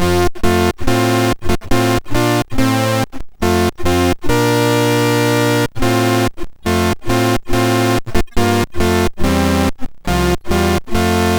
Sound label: BASIC sid MOS6581R2; C Users Guide V2; Commodore